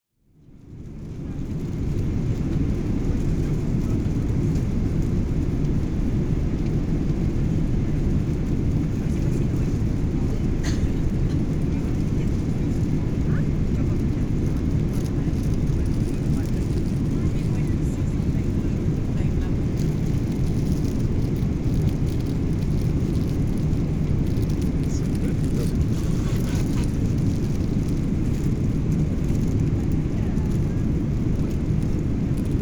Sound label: boeing 777 ambience unfiltered; airplane inflight audio recordings